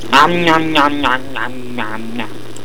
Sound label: omnom; edd